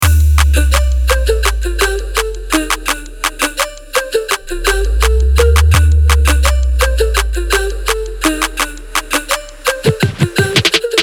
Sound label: 84 Dm Dancehall